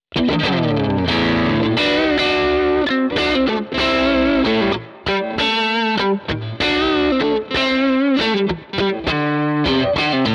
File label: Vintage Single Coil